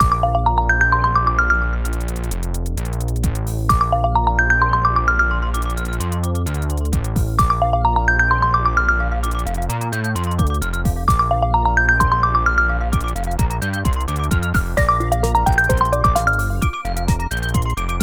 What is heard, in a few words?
Ringer, ringtones; Sony Xperia 1 II